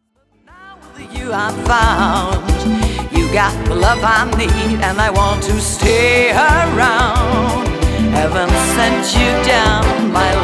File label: rock pop classic